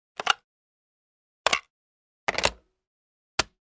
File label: Telephone receiver is picked up, hung up